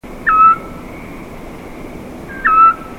Otus scops (song)

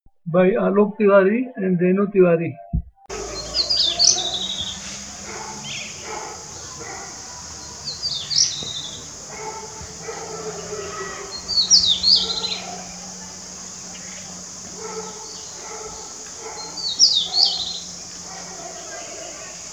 Grey Bushchat; Alok

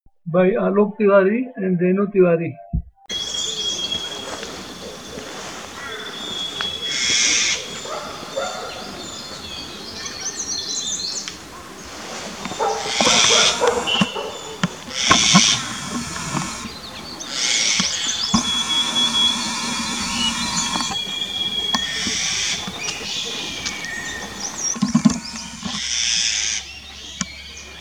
Eurasian Jay call; Alok

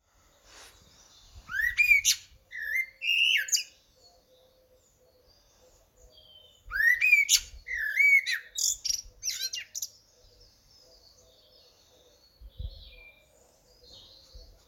Eurasian Blackbird; Cambs

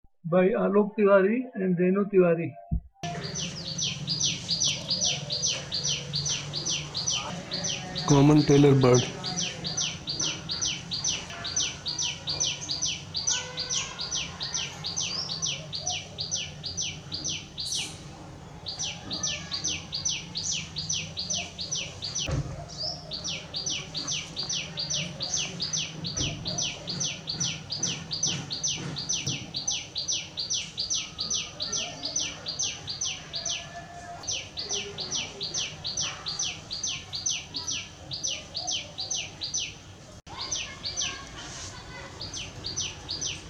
Common tailorbird; alok

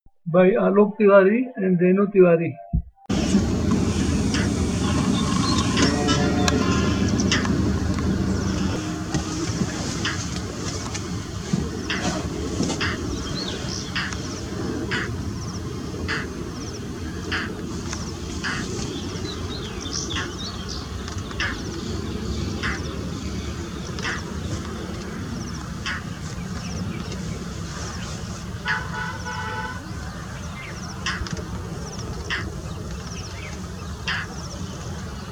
Indian Roller call; Alok

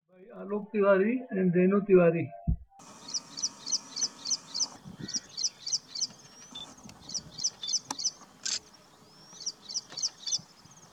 Ashy prinia; alok

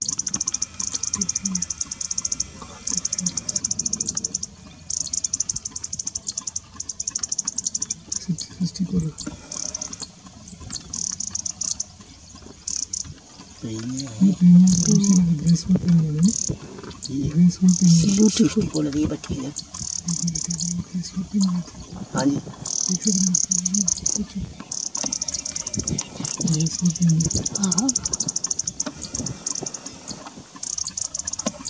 Delicate Prinia; Alok

